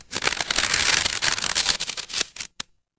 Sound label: paper fabric crumple